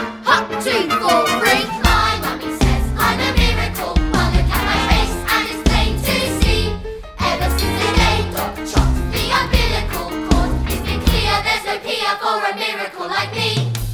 Miracle Guide Vocals Ensemble

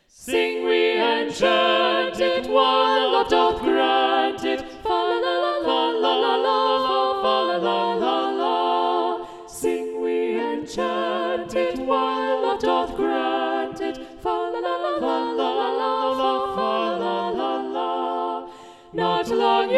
SATB Bass Muted; Sing We and Chant It; Thomas Morley arr Russell Robinson

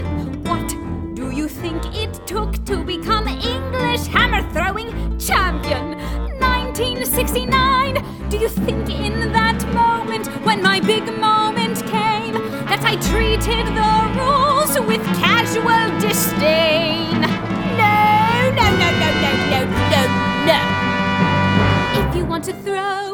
The Hammer Guide Vocals; Trunchbull

